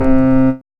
RHODES; E-Lab; X Static Goldmine 1

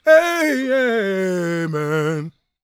GOSP MALE, Vocal Planet Gospel

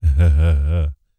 LAUGHS, Jazz Fx, Vocal

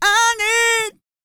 GOSPEL

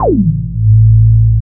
BASS

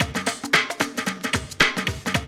LOOP, DRUM; MLOP DRUM; E-Lab; X Static Goldmine 2; akai

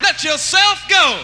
VOX; ZERO-G; Datafile

